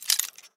pick movement, lockpicking

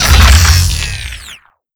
gauss siege stop; doom gauss

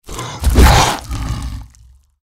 deathclaw attack power forward; npc

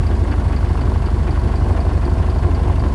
tank idle

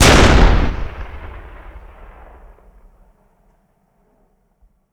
tank fire

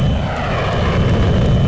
rad loop

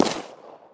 glock17 single dist

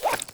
pistol draw; ins2; weapons

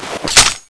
clip in; tfa cso; heaven scorcher

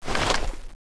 scar draw; cso; scar oz

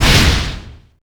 magic arrow hit; queen girl

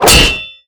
blade

